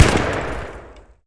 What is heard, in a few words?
Automatic Utes End, Weapons; DCS